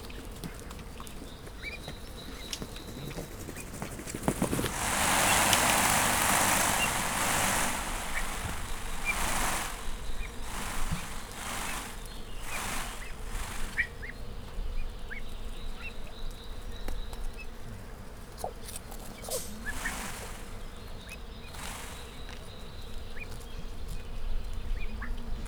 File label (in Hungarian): tollazatat rezegteto pava szello; felsolajos zoo 2015; cardioid